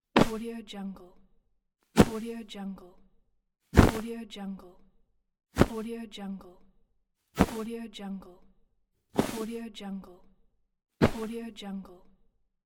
pillow fall; audio therapy

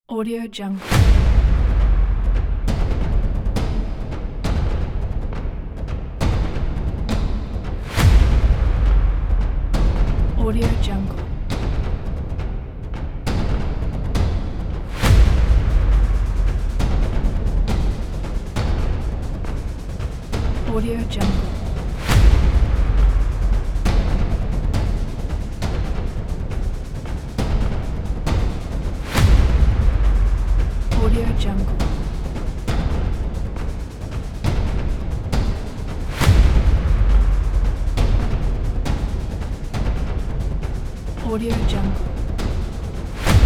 march drumming

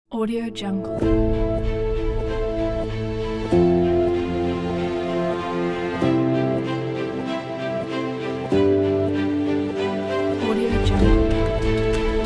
heroic victory

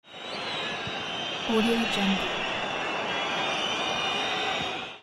fans whistling

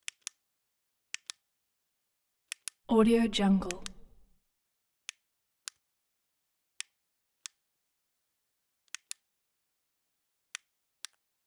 flashlight maglite on off; soundmorph

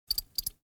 bug legs vibrate

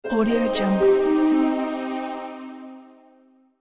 heroes demise

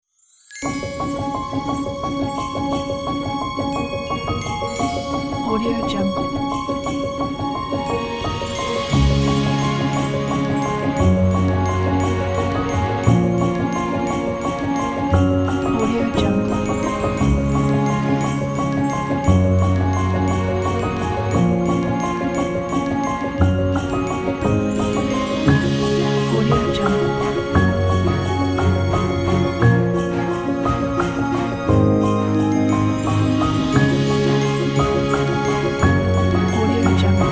christmas piano story

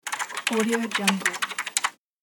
typing on a keyboard

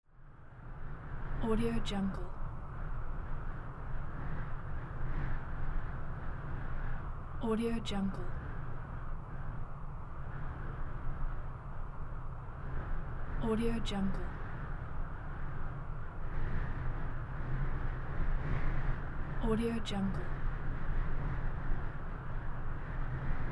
light desert wind

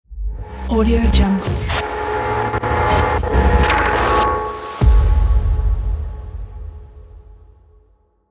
glitch logo

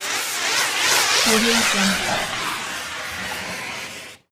quick spray of a fire extinguisher

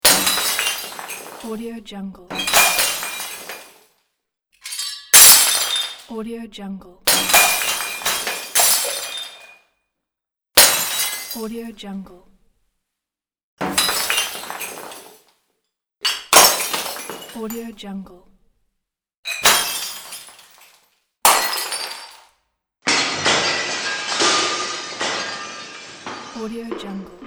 break and wipe up glass

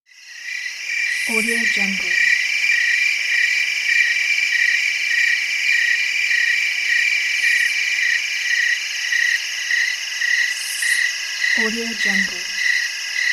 crickets at night background

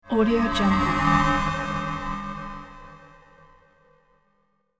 dark realm horror asset

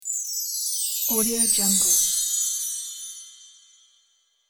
christmas wind chimes

